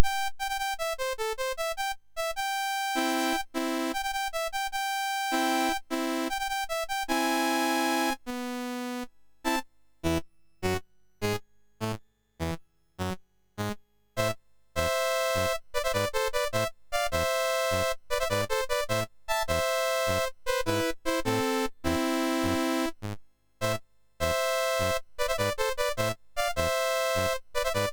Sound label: Season Jingles, MOS6581R3